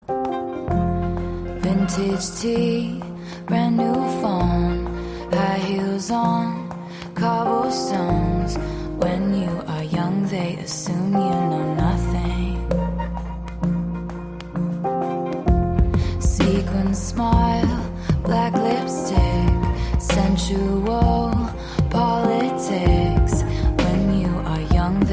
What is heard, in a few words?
English Ringtone